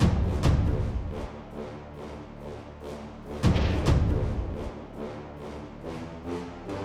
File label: Drums Cinematic Energy Tones 140